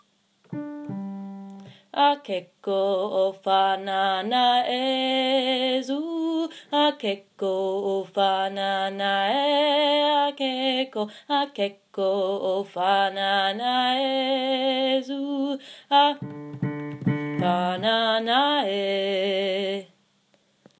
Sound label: Bass